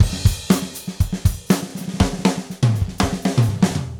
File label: Gretsch groove 120 BPM, 1967 Gretsch Kit drums